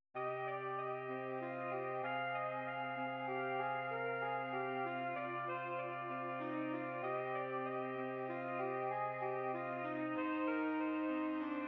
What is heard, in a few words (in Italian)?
Quartetto, MIDI